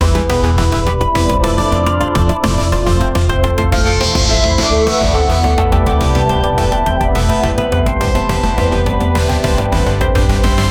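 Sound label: game soundtrack inspired, video game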